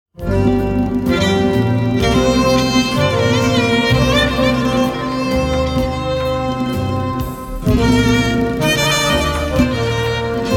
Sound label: Instrumental